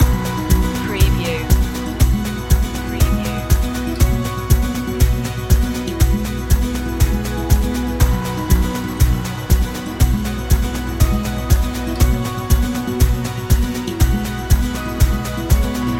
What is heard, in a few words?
together we go loop